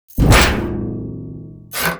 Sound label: Swinging Slam SFX; Animated Wipes